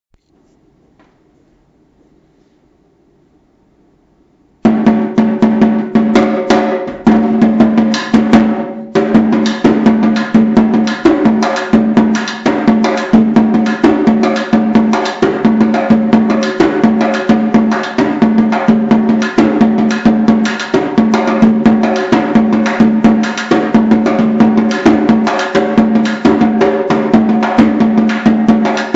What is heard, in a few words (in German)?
samba repique einstieg groove ausstieg